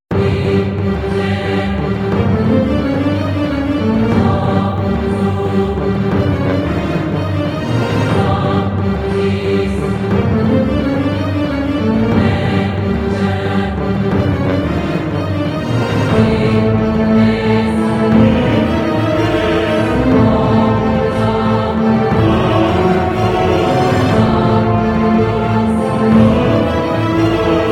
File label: choir